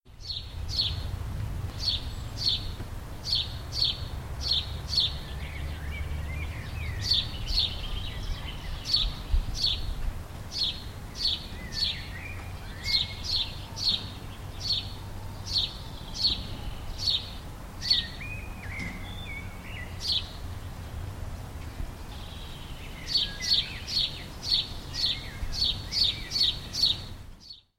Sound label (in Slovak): vrabec